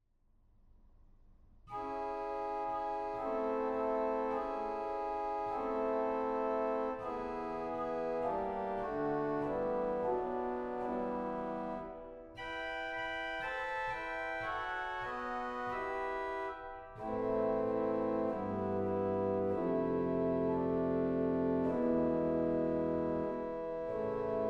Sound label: Gamba 8, organ; goenn